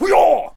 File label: Hwacha Attack Vocals